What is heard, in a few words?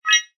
multimedia notification short digital futuristic beep generic